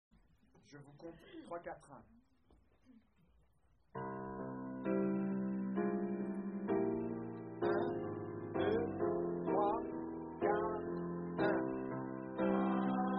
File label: alto